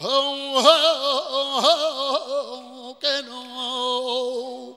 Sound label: FLAMENCO VOX; Best Service; World Colours